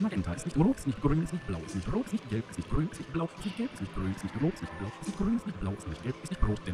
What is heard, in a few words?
loop